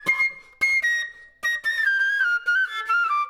Flute

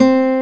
C3 NAC GTR; roland1

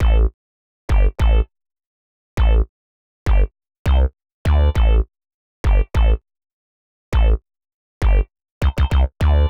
Synth Bass; Basses

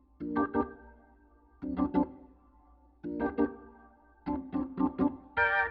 Organ

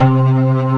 C3 ROCK; roland1

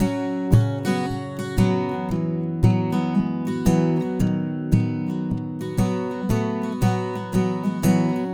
Acoustic Guitar; Guitars

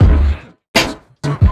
Urban Beat, Drums

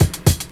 Old School Break, Drums

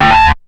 SCREECH; SoundFactory; SMPMANIA; EFFECTS

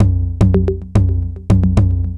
Electronic Percussion

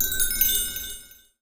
Acoustic Percussion One Shot

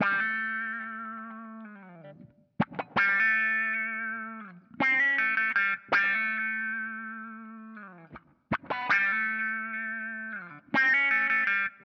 Electric Guitar, Loops; Guitars

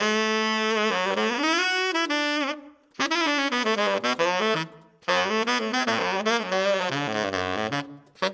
Sax

